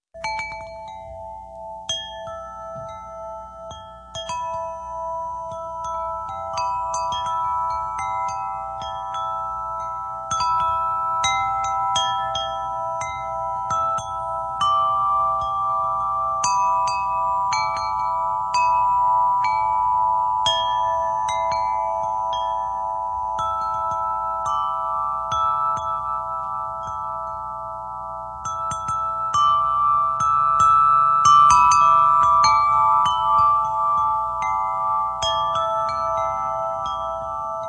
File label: Himalayan Echo Chime